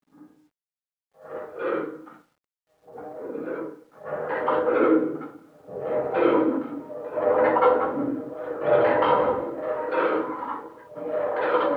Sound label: Locks; Chalice Well